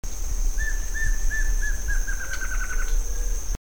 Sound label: Dysithamnus mentalis bouncing ball